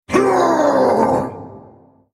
ultimate effort; HecarimArcade; Character Voices - English

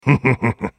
laugh; XenZhao; Character Voices - English; en US

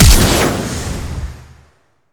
summonerspell base flash; LoL

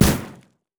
turret explosive; LoL; heimerdinger

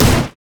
ancient golem basic attack; monsters; LoL

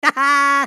ultimate effort; Heimerdinger

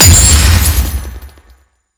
gold acquisition; LoL

